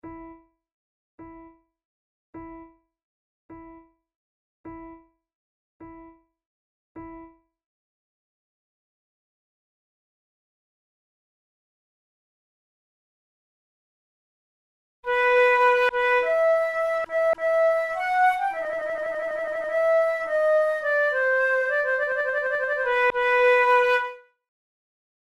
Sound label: two flutes prelude; Monteclair; 6 concerts; flute tunes